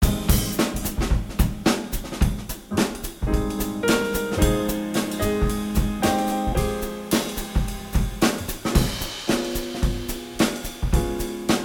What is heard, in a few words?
funk no bass, Playbacks